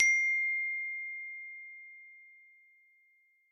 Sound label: sms alert note ringtone; Alertă Sms; ton de apel